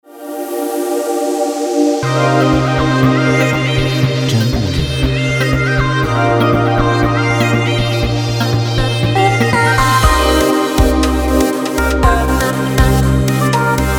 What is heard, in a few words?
Night Phonk Intro